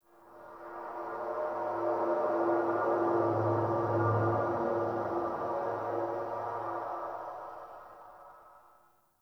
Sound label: Cymbal Swell; Ambience; One Offs; Champion Crypt